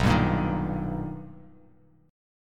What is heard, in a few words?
B Augmented Major 7th B Bass Standard, chord; strummed; JGuitar